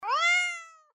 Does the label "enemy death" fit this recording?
Yes